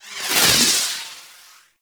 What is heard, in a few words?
Melee Proj By; Enemies; Abilities; Warframe; Corpus